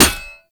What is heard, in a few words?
Bayonet Melee Quick Impact; Warframe; Tenno